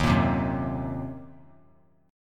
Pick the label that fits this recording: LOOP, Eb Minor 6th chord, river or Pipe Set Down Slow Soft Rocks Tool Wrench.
Eb Minor 6th chord